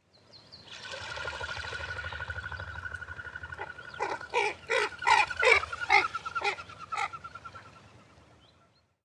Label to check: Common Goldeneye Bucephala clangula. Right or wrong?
Right